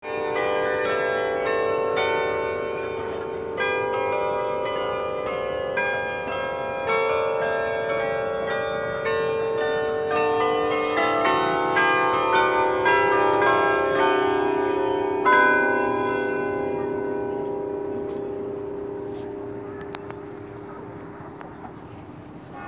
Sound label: new church bell delft